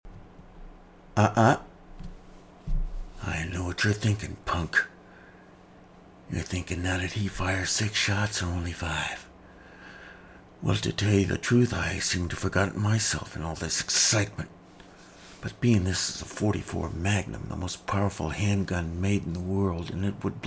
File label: laugh and shot